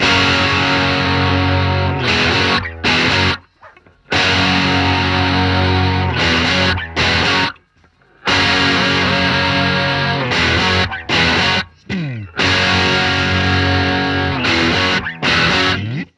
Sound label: Chorus Guitars; Multitrack; Remix Parts